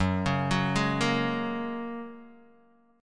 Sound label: F sharp Major Add 9th, Guitar DADGAD